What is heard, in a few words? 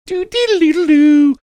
singing series of notes